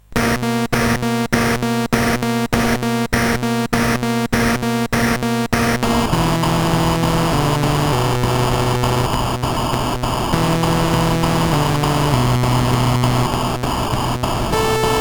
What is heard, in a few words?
Drums and Stuff, CSG8580R5; Karz